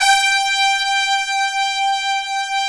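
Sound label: trumpet section G4; Synth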